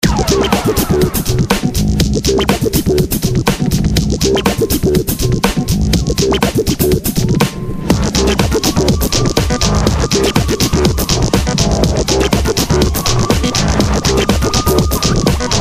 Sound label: undertone